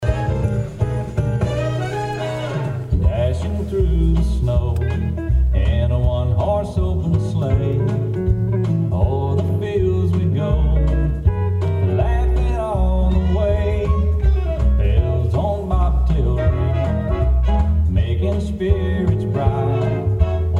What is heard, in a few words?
Jingle Bells; CountrySounds